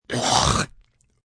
Snort Hork, Snorting, male, clearing throat with mucus (human voice); VoiceBox